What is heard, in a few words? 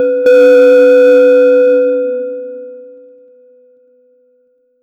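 create a medieval survival, negative feedback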